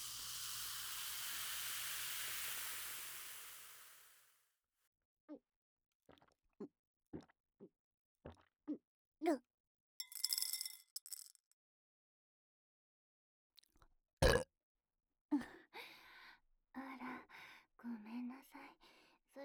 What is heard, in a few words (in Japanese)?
げっぷ; フェチな彼女のいる日常〜げっぷ祭りの夜〜